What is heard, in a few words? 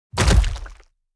breaking Block